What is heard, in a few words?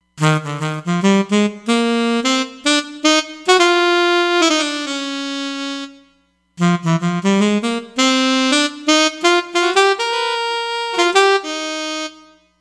Alto Sax